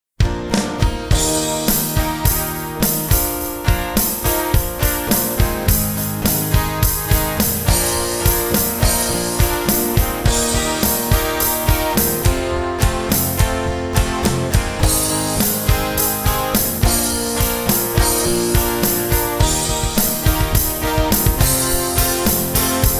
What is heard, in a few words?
acoustic guitar